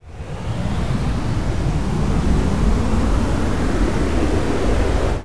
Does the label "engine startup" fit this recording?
Yes